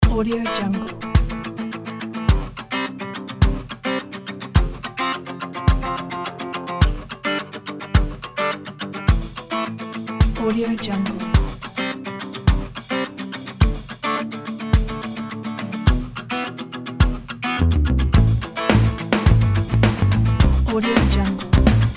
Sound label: going live